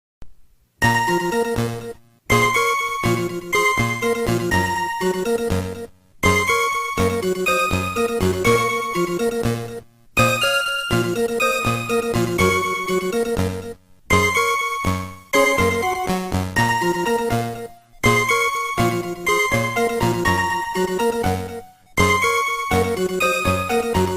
questioning moderato; ace attorney

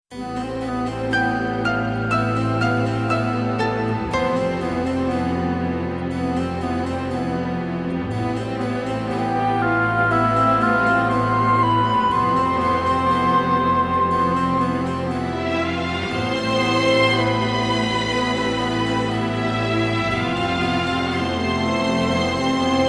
suspense

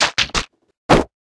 arrow painting; viridian

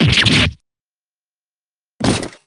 fall

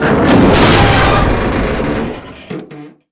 elevator shake

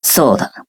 hmph; kidgami

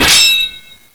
slash

